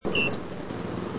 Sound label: Loggerhead Shrike